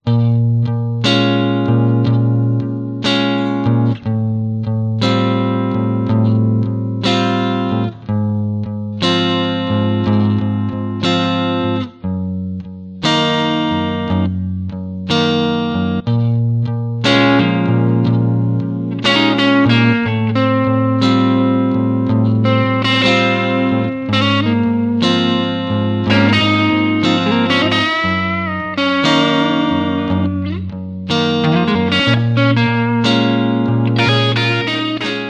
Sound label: Songs For Guitar